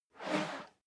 whoosh whistle slow

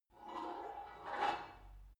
take off light weight barbell soft; sport freizeit

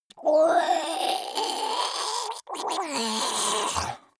monster die gurgling high; kreaturen

